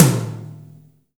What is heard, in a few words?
TOM, Drum Dry; East Collexion; Drum 1